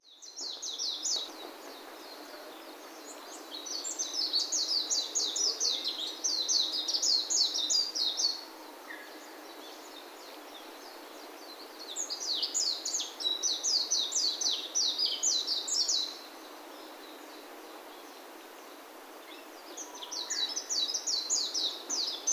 mejiro

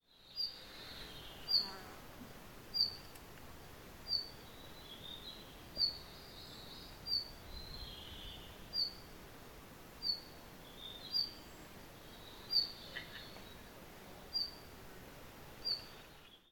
ルリビタキ 地鳴き; 日光白根山

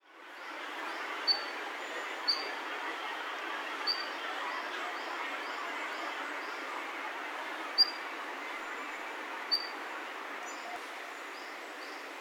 エゾムシクイ; さいたま市

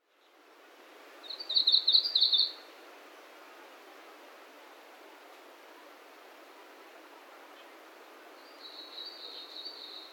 メボソムシクイ, 尾瀬沼

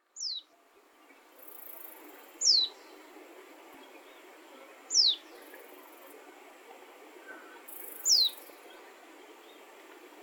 mejiro

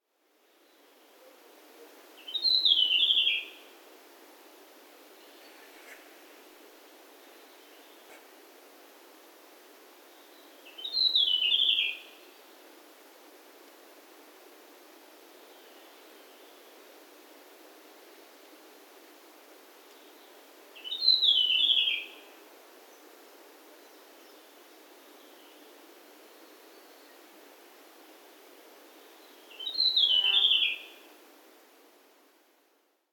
ルリビタキ さえずり; 日光白根山

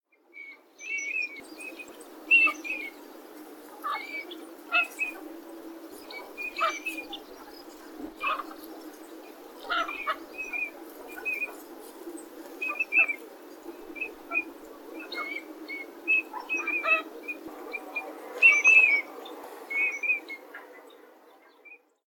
コガモ; さいたま

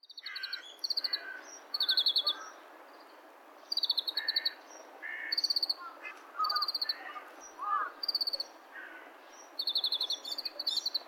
kawarahiwa; saitama; ohyaguchipark

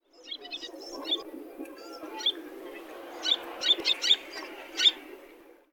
koajisashi, sanbanze

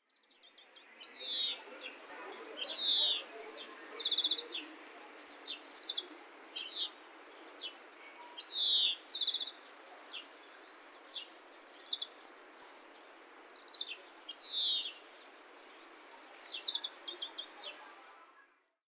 kawarahiwa; saitama; ohyaguchi

